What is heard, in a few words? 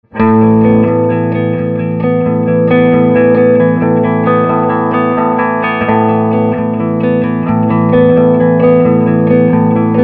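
SG clean, Gibson Historic SG; Swart AST Pro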